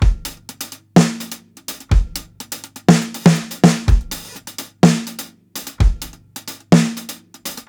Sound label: 125 HALF TIME BROKEN HATS, DRUMS LOOPS; BASEMENT DRUMS VOL 2